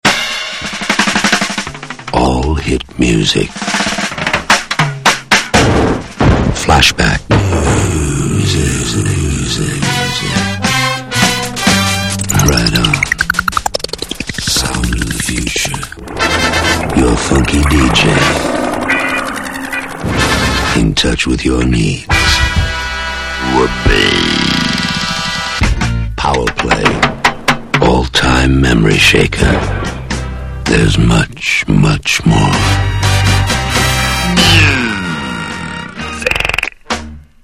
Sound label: jingles